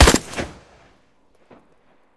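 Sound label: single lightning-fast shot in open space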